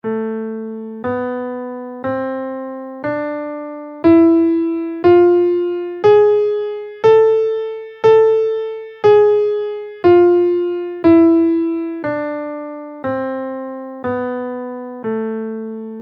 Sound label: harmonic minor scale in Am, guitar